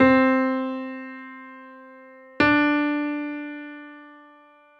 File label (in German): grosse sekunde, gehoerbildung